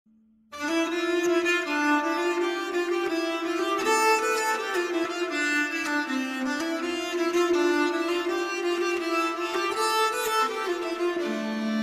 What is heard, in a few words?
Medieval Violin